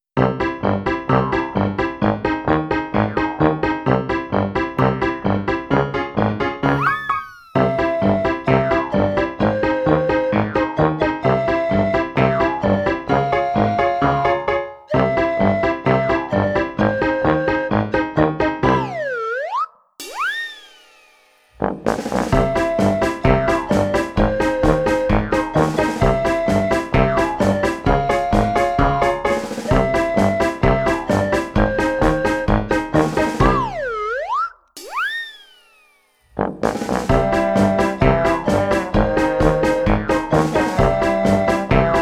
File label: Penny Whistle Polka